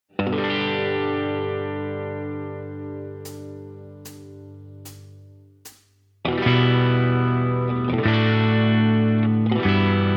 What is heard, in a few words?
(G) No Backing Vocals